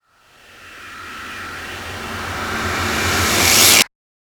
distorted scream riser; SoundsCrate

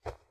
cloth pull; soundscrate

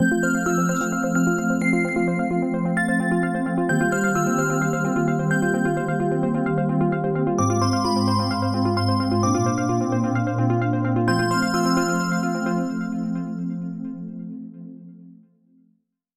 modern news logo; soundscrate

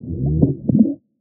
underwater burp; soundscrate

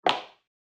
footfall heel; soundscrate

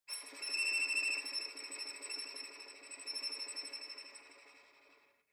Violin Shivering Tremolo Long

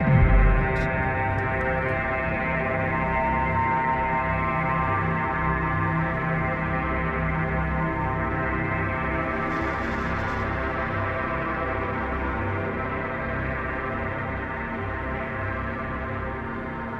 Suspenseful Ident; soundscrate

